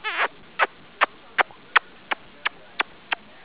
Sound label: KISS KISS